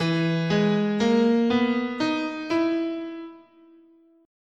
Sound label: E Chinese, scale